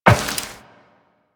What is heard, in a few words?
Step Metal Perforated, Footsteps, Walk; Warframe; Tenno